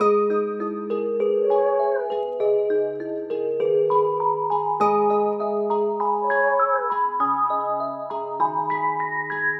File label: Dark Melody Loop - 100 BPM G# Min Bells; Loop Stems; Cymatics; 2020 Melody Collection